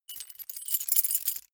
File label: key ring mid size rattle hard; objekte; schluessel